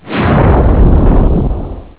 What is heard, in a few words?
close valve